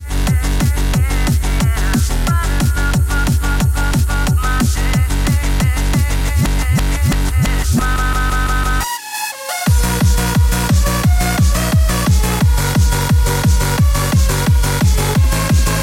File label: hardcore mix, hip hop ringtone